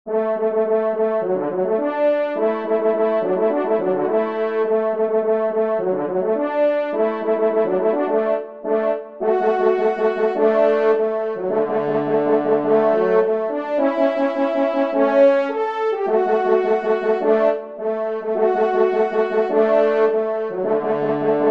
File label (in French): trompe; Souvenir de Beauvais; 3e Trompe